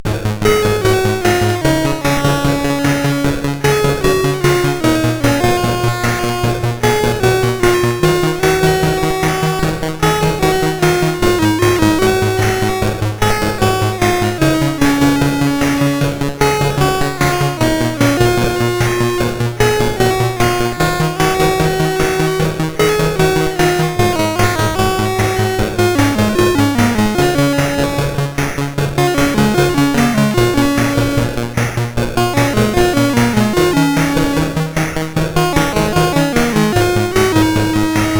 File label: Synth Sample III, CSG8580R5